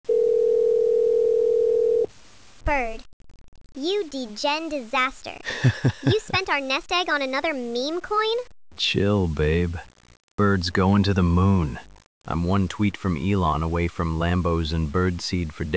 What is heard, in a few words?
birdcall